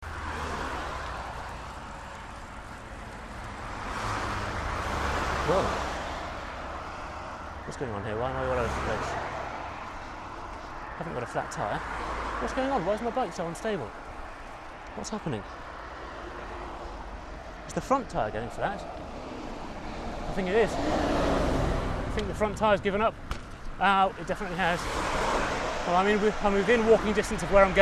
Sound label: tyre; irun